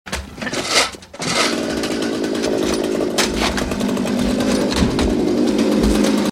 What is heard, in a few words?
SMALL MOTOR SPUTTERNING; car